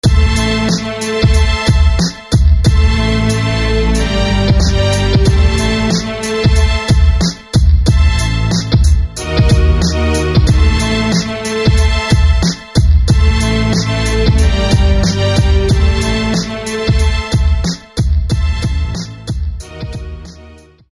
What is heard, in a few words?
Obsidian Strings; SFB FX